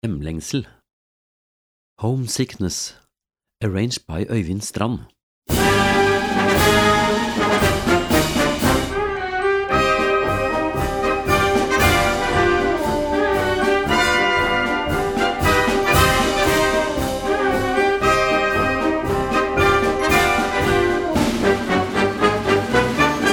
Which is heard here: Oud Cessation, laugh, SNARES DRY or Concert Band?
Concert Band